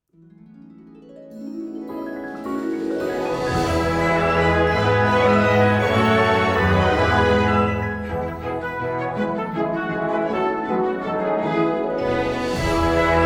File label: Celebration (Brass Variation); Symphonia Original Soundtrack 2024